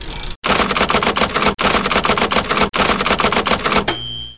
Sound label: typewriter; Twister